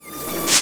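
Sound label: FX REVERSE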